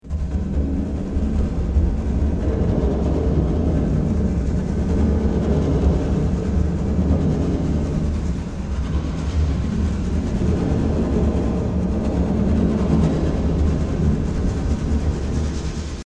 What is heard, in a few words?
noise